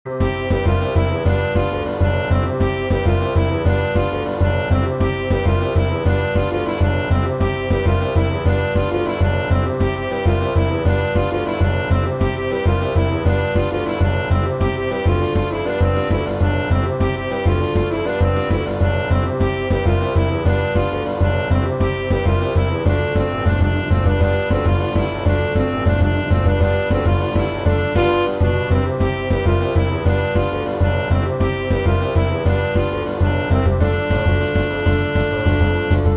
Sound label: Samba La